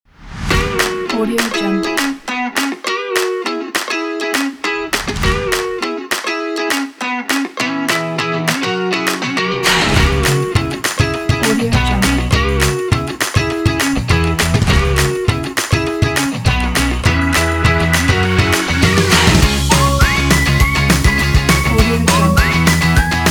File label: happy indie sport pop rock